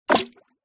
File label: game designed water hit thump